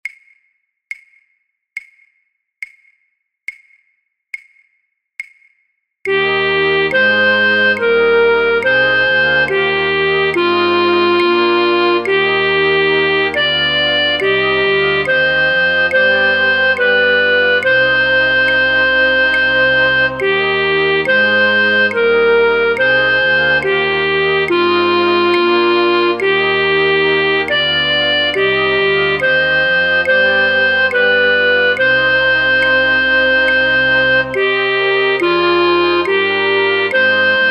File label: flute choir, Fluit 5A, Fluit 5B; Matthaus